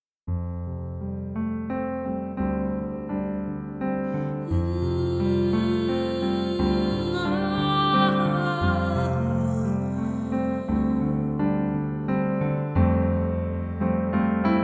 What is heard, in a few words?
Halleluja; demo trauung